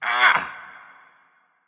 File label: grunt hurt; smite; npc; olympus